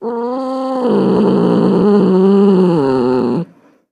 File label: Cat Domestic Growl